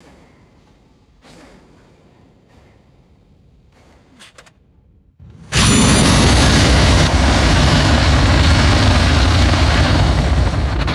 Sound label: heavy metallic fuselage vibration bursts, aircraft